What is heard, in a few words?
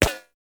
bullet fly by